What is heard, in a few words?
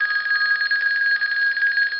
Telephone ring